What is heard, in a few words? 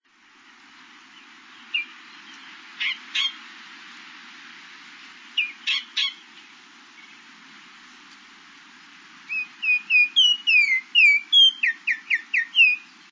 Altamira Oriole